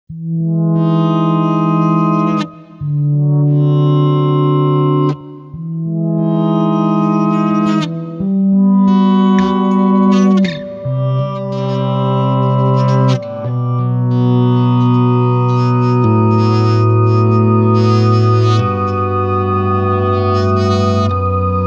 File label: MOOG